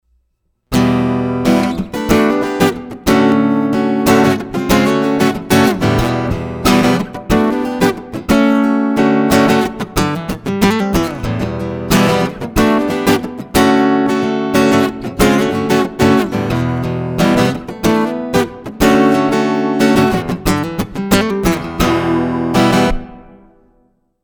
Fishman Aura Spectrum Dreadnought Percussive Ibanez AW54 Bypass